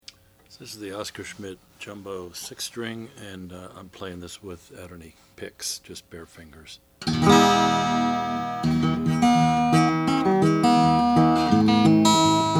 oscar schmidt stella natural

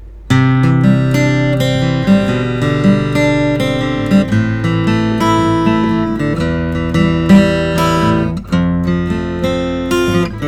Bourgeois custom OMC

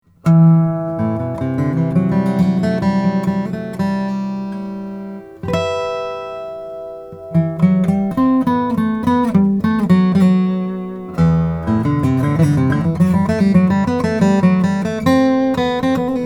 strahm eros madagascar rosewood

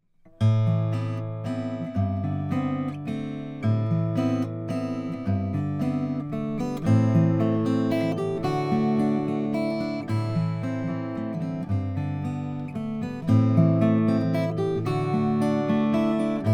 taylor bto tf madagascar rosewood

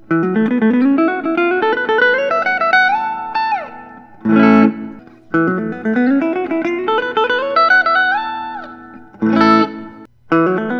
gibson custom; neal schons

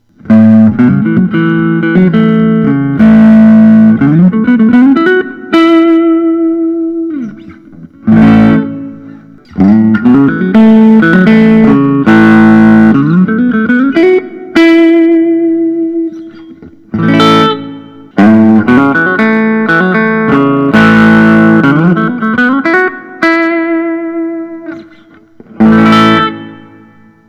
whitfill slimline; 2tsb

